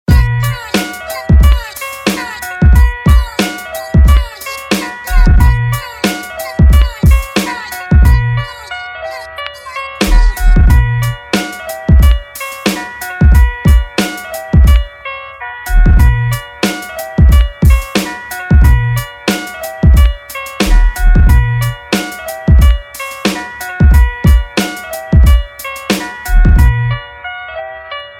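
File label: INST 96BPM B minor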